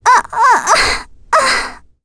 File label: Vox Dead; Requina; kingsraid